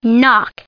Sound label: KNOCK